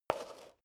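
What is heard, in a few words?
step high heels concrete slide short, footsteps; schritte; menschen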